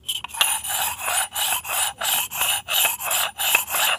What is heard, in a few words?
Cup grind, Foley; SFX; 7rystan's 600+ FREE EDM SAMPLES & DRUMS